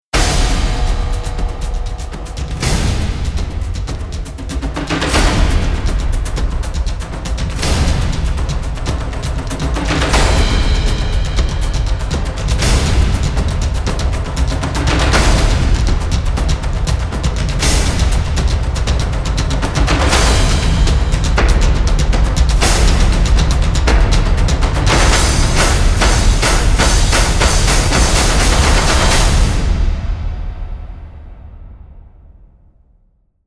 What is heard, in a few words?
percussion only version